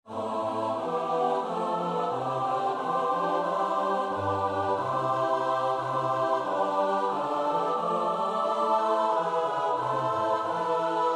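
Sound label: soprano alto tenor bass; Bach (Johann Sebastiaan)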